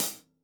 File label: hihat - closed side; JazzFunkKit